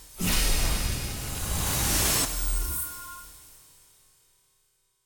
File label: white magic holy; B Path of the Teal Lotus